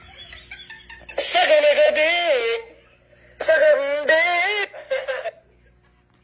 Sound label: singing